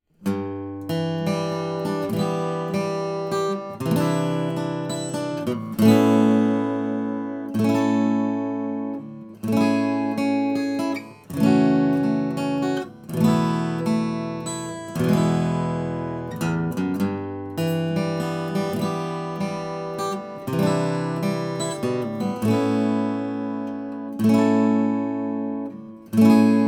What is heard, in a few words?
Martin OOO-28